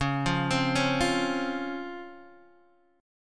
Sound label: C sharp Major 7th C sharp Guitar DADGAD